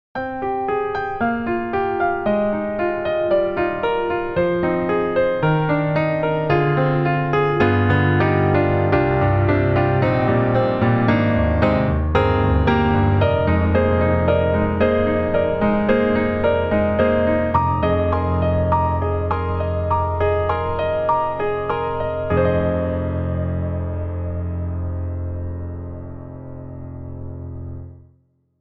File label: Custom C7 Rich Ballad; Spectrasonics; Keyscape